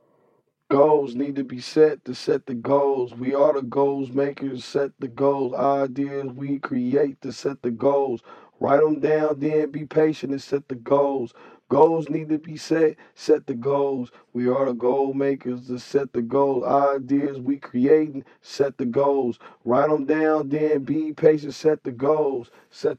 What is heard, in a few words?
hip hop, 147, C sharp, Acapellas